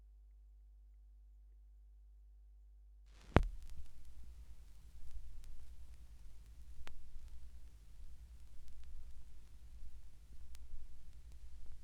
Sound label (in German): schallplattengeraeusche